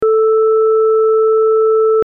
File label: tibia, hammond drawbar; Tibia 8′